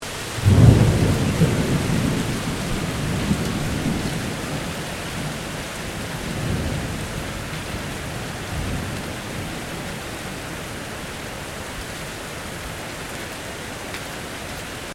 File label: THUNDER MEDIUM HEAVY RAIN, Severe hail storm; Weather